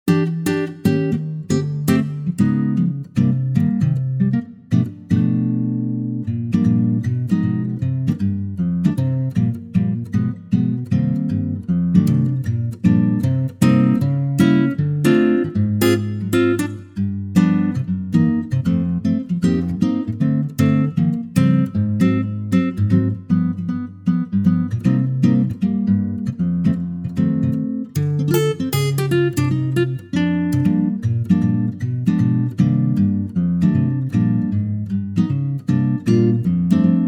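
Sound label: Bb acoustic guitar; key - Bb - vocal range - A to D; Superb acoustic guitar arrangement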